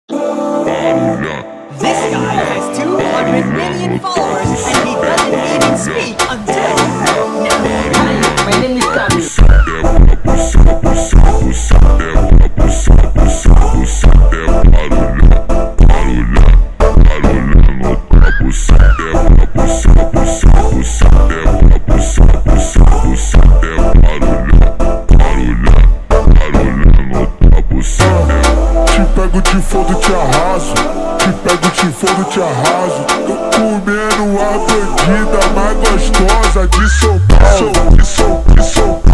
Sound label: funk, super slowed reverb